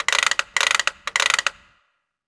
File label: GUN TOYS; USB Soundscan vol.27